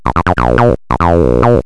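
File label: slide; x0xb0x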